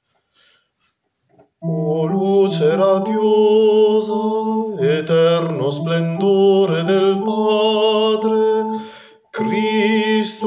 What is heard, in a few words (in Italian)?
O luce tenori